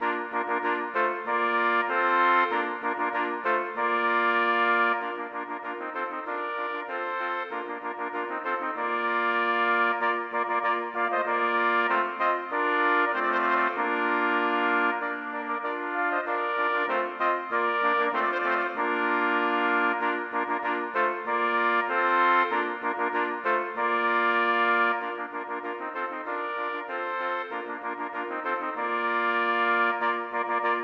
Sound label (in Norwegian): For fire trompeter